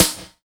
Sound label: METALLOID, BONUS SN; BONUS SN2